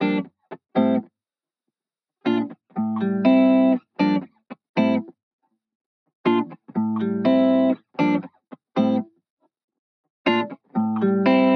Guitar Chords Dry Dm 120BPM; neo soul rnb and hip hop; ME Haze; audio elixir

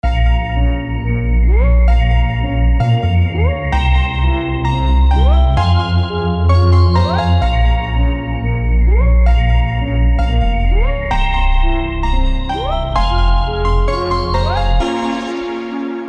Jungle Loops

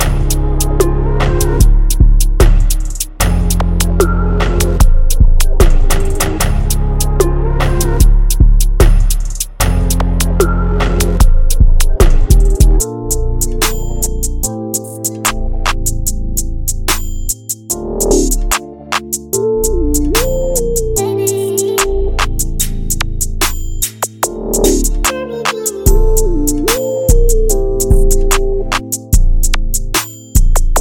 sexy drill; spice time sexy drill bundle by raven

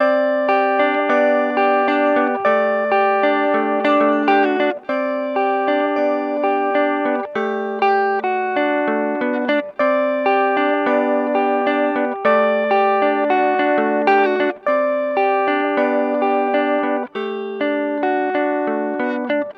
Angel G maj 98 BPM

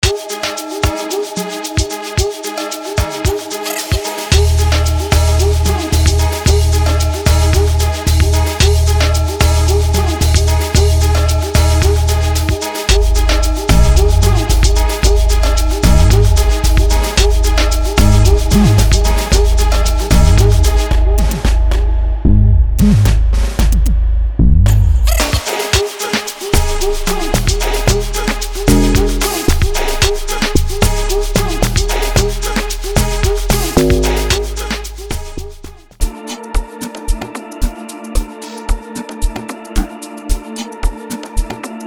Piano Train; Piano Train Vol. 2; 10in1 amapiano bundle by wooloops and tru hitz

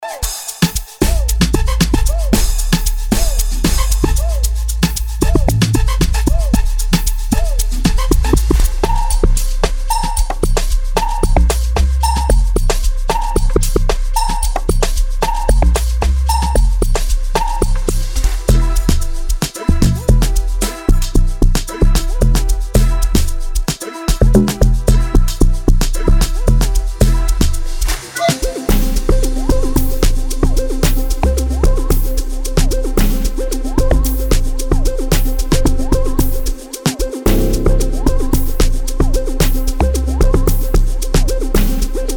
Amapiano Trend Loop Kit; 2025 super amapiano bundle by sliquejams

